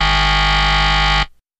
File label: Buzzer Elect Game S, electronics